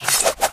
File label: Fencing Soldier Attack; Pirates Of Treasure Island